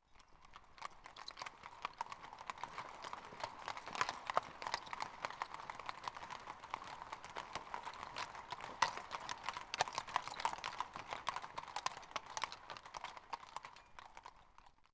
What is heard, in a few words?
Horse Cobblestones; Ambient; Civ4 warlords